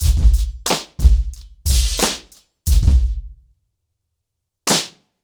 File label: 90BPM, acoustic drums; The Roots Drumloops